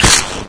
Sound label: head bite, headcrab fast; Garry's Mod; hl2